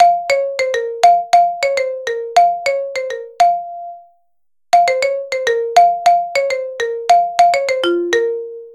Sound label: viber minor